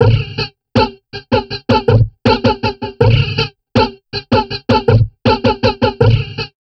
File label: Drum Loops Crazy Processed; USB Soundscan vol.07; AKAI